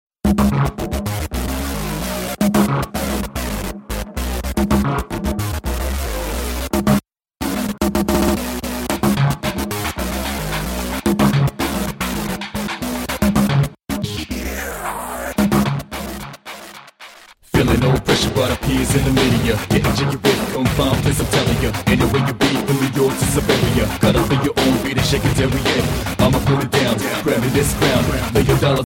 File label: background music tone ringtone